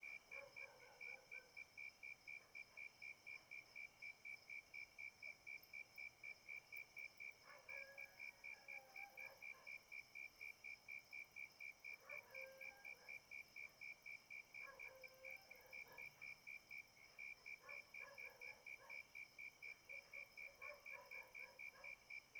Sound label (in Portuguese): Grilos de madrugada com cachorro latindo longe e morcego; insetos